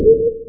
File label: Next Round; Realmz 5.1.6